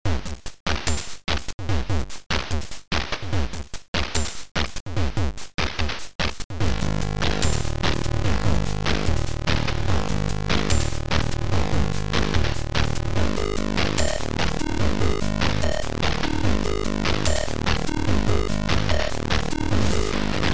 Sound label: BREAK; Noize